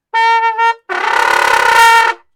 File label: trumpet fart